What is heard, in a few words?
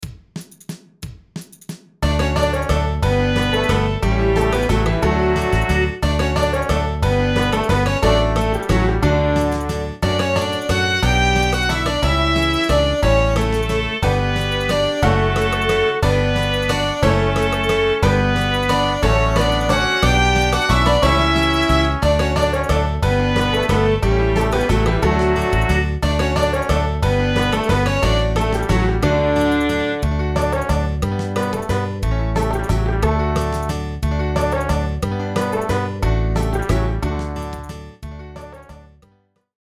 BGM; Italy KeyPhrase BGM DEMO